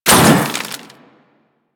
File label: Proc Heat; Mods